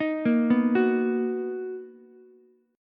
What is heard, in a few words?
B Major 7th B down Ukulele; arpeggiated; JGuitar